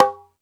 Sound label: Percussion, Drum Machines